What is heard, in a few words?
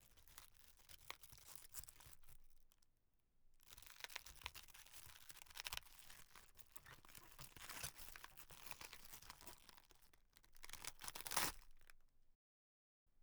Break Kale Long, Gore; The Gut-Wrenching Gore Library